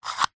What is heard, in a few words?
battery tester plastic slider slide; foley; zapsplat